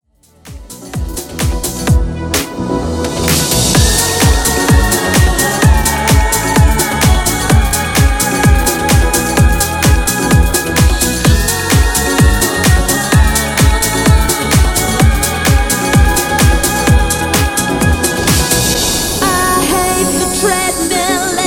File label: Club Re Drum 128Bpm